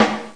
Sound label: Snare Drum; System 7 Sounds